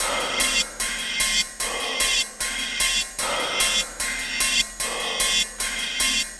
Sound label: Cymbal Loop; Electronic Point Blank